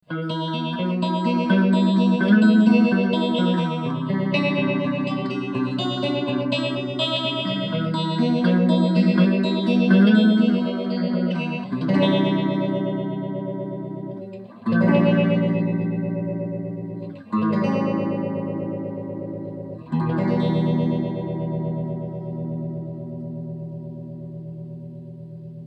Full Phase Fast Speed; Red Witch

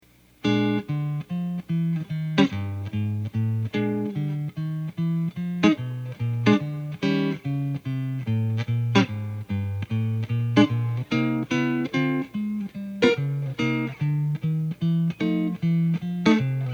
RW Blues Walking Bass

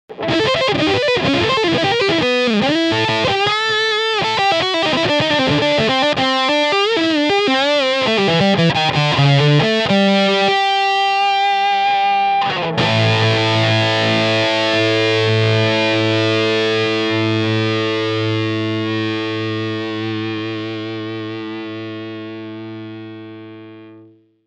Lead Tones 4 Watts with Treble Booster

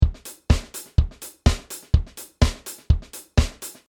House 125

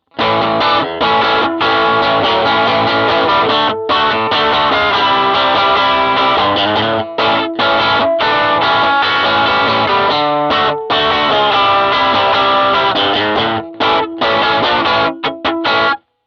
raspy tweed

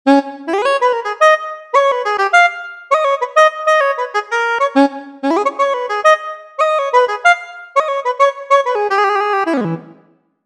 Soprano Sax

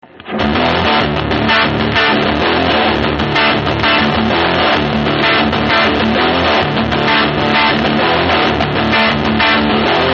modern hi gain

